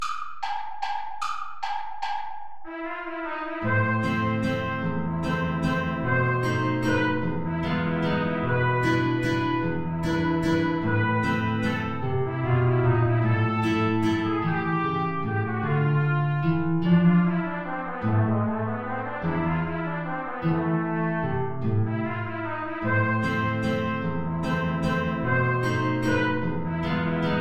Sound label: play along without guitar; traditional